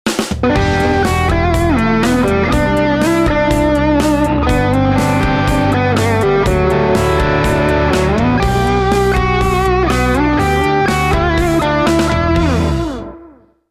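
Duesenberg Outlaw MXR Dyna Comp Modified OD Carbon Copy Fender Deluxe Reverb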